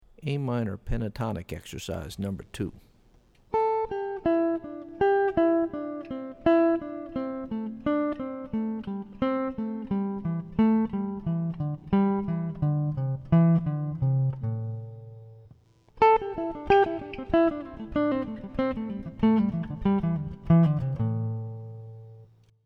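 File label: Am Pentatonic Exercise, guitar; lead skills materials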